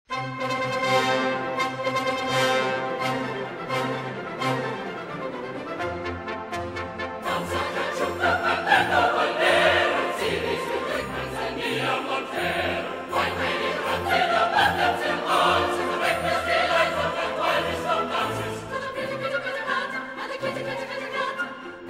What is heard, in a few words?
Dance a Cachucha